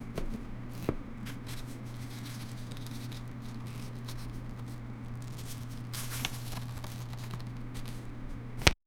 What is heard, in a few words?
pinning paper on corkboar